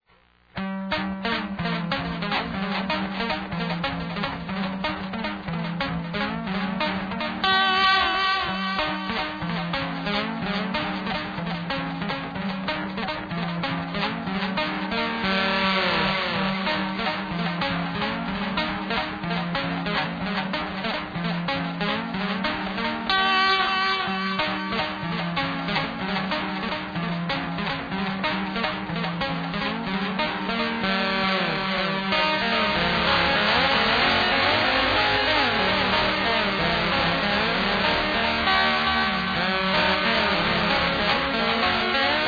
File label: Lap Steel; D.F. Duck